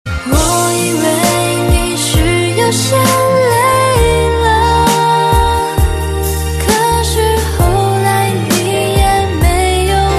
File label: chinese ringtone